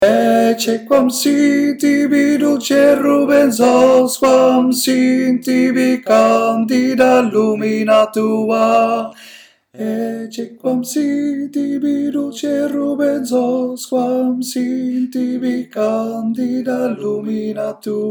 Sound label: TENOR stem; ECCE QUAM SIT